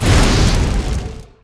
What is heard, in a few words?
fire Cycle Exp